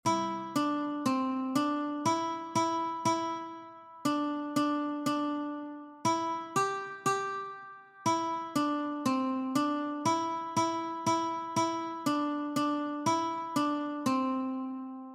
Mary had a little lamb Ukulele key of C

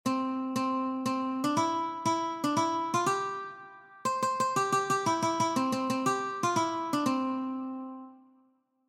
Row Row Row your Boat Ukulele

